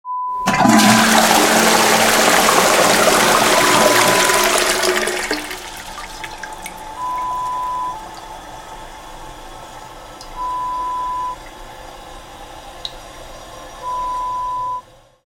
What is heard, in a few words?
Flush sound